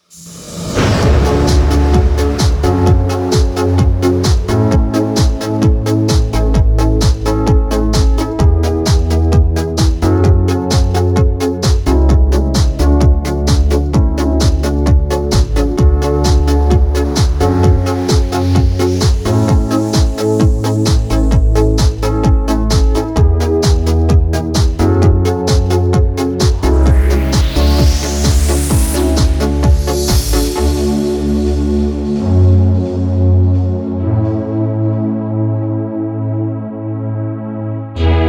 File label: dance, 432 Hz